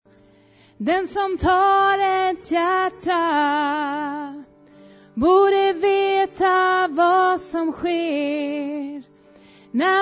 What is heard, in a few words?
tenor